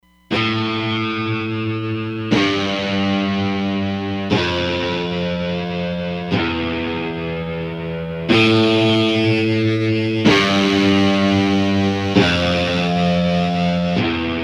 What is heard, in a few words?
Flanged Non Linear, Roland DEP-5; Factory Patches